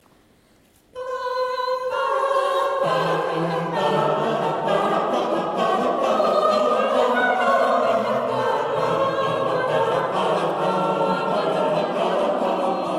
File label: motet Amen arrangement; Brahms